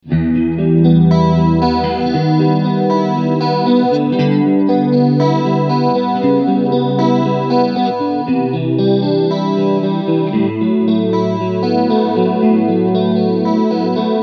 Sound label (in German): Chorus Rate 9 Uhr